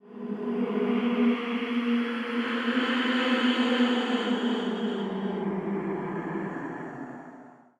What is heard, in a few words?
Ghost